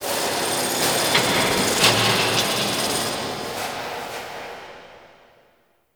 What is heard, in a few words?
Asteroid Mining Machines; Ambience; Warframe; Grineer